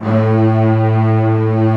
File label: STRING SECTION A1